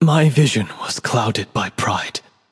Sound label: death; erlangshen